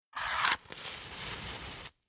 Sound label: Fire Two Matches Struck Together Slow Single Strike Large Flame; Flames